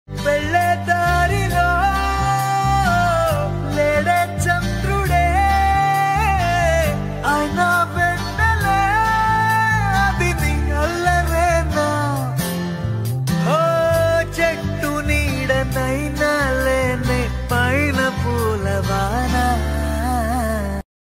song bgm telugu love song; kumari 21f

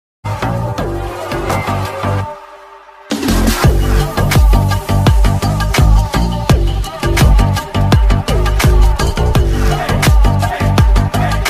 bgm ringtone flute bgm; jailer

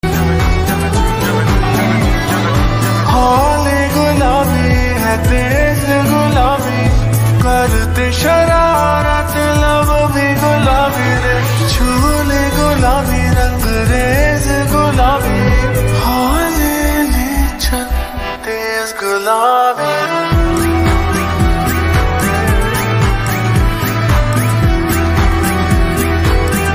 slowed reverb

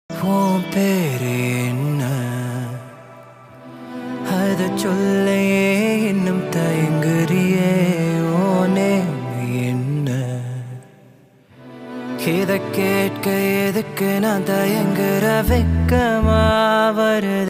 malayalam album music

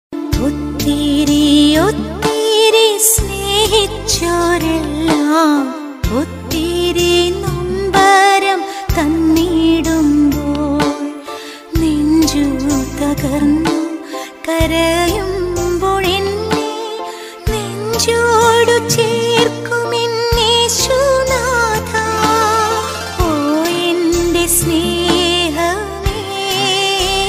malayalam christian ringtone

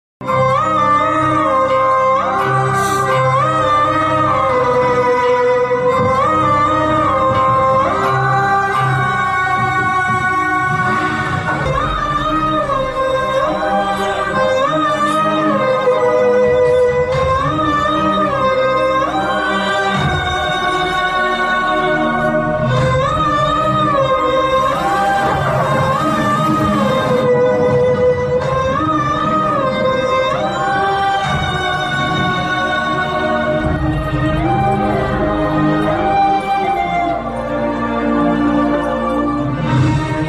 mother emotional bgm ringtone

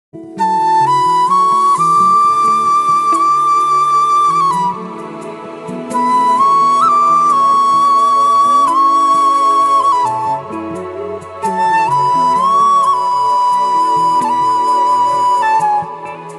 instrumental ringtone, jesus ringtones

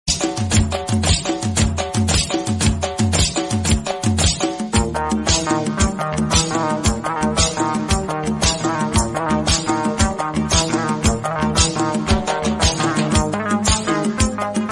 dj remix music

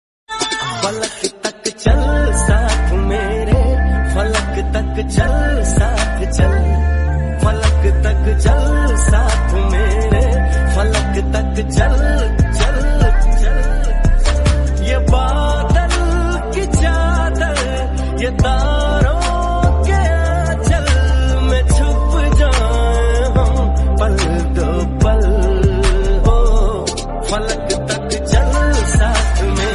lofi ringtone

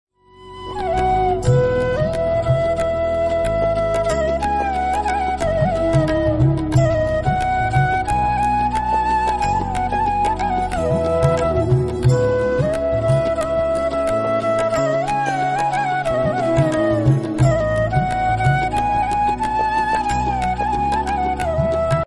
flute ringtone flute ringtone new flute ringtone; shree krishna govind hare murari

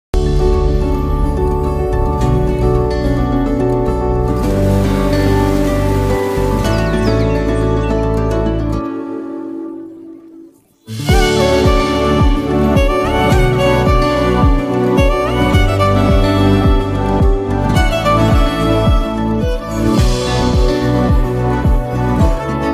movie bgm, tamil movie; amaran; sai pallavi

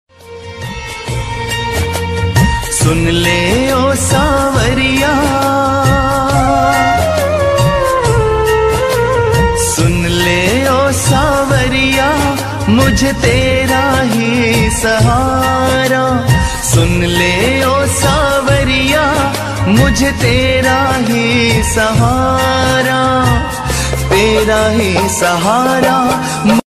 radha krishna bhakti ringtone

